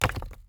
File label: Panel Sort Sample Drop; Among Us